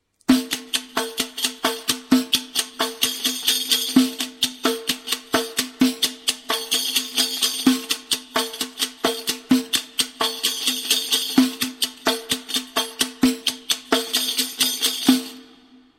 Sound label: riq